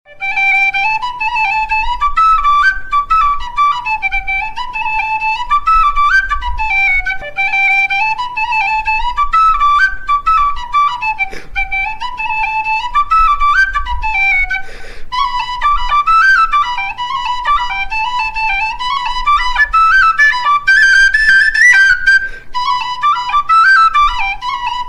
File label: Every Whistle D